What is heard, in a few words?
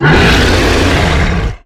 Harpooned; Evolve; Behemoth